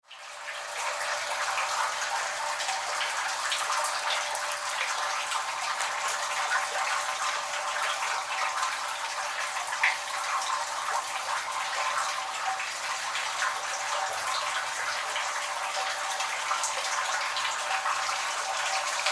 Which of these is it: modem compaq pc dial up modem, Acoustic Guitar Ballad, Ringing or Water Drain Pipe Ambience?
Water Drain Pipe Ambience